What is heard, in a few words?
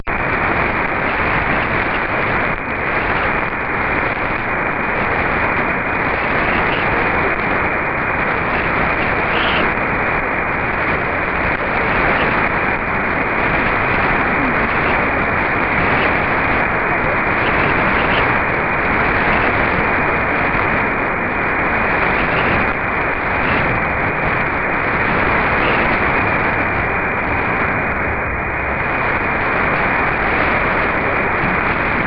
netSDR; CRI